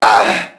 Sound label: mobster get hit; enemy; Max Payne